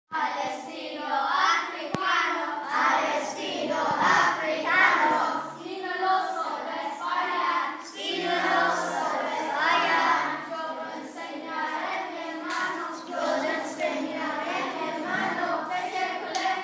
year 4 drumming performance

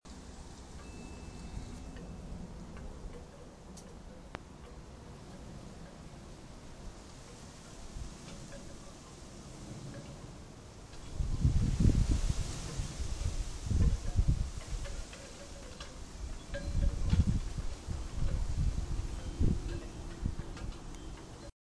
windy garden

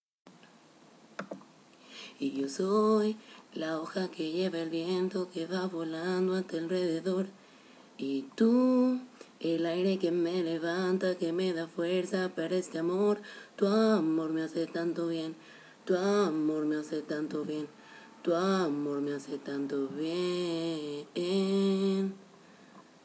coro

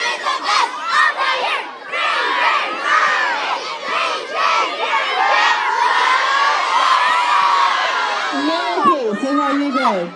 cross country cheers

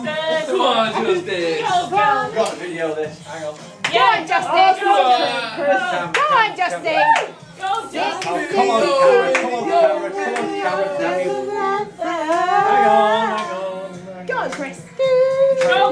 singing

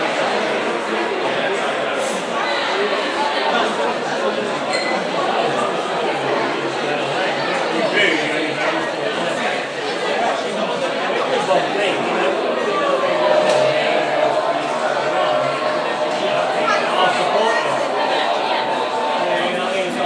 busy pub

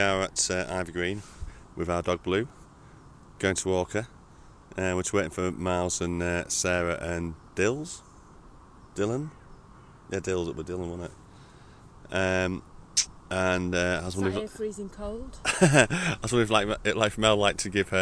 dog walk

